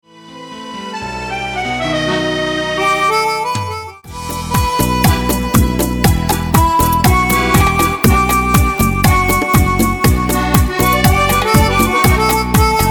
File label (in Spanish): BachaTango